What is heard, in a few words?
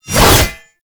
Bayonet Melee Lunge; Warframe; Tenno